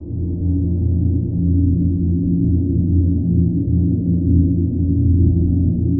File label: cold chamber ambience